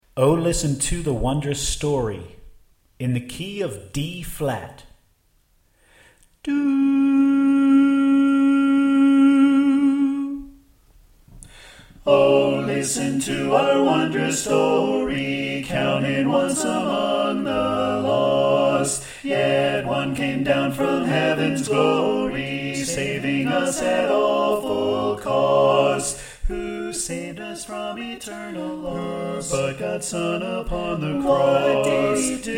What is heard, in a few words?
Tenor Left